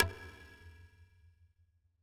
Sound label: perc, Percussion; Trance Explosion Vol1